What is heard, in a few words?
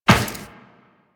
Run Metal Perforated, Footsteps; Warframe; Tenno